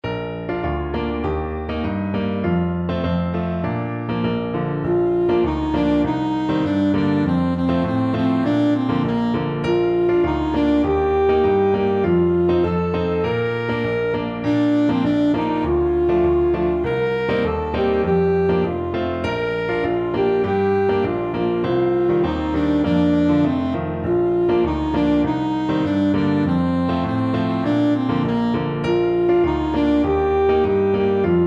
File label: saxophone